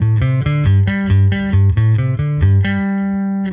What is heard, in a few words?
EBass Brdg Pick 136 A1; musicradar; bass boutique